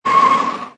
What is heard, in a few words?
Car Or Motorcycle Brake, Tyre Screech